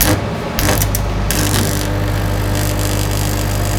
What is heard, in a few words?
Welding